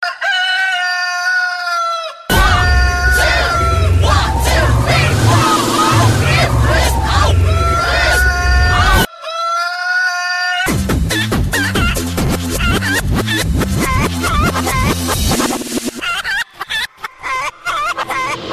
Chicken Remix

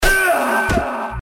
Death; Katana Zero

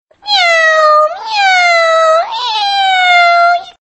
Cat

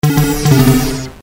Dice; SpaceCraft

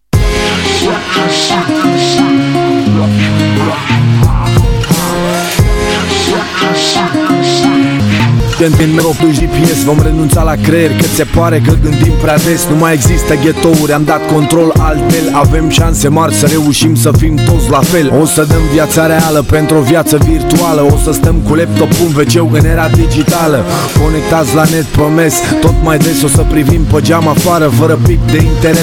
Exciting Hip-hop

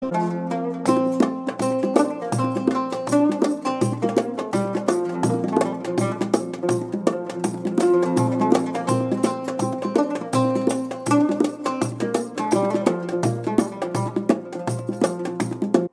Arabic Ringtone

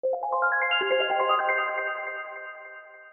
Simple Future Ringer

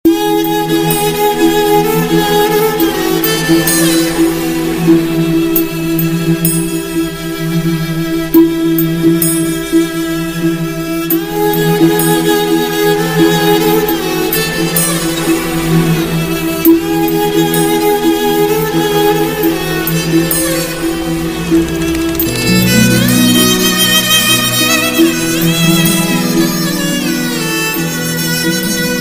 Tamil Melody

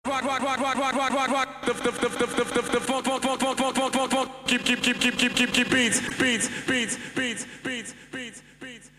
Rock Funky Beat

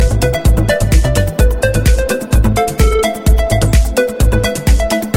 Musical Sms

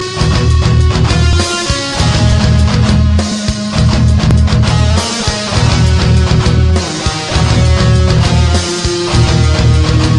Metal Guitars

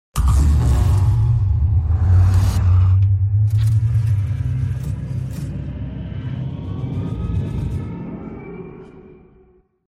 Power On

